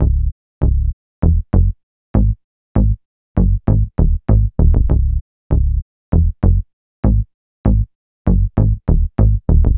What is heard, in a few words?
Bass Flubber; Sonic Foundry (Sony Creative Software); Club Joints and Street Anthems